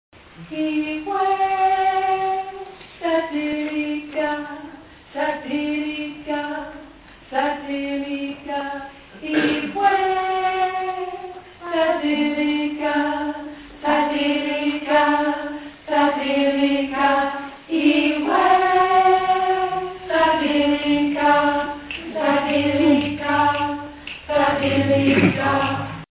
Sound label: alto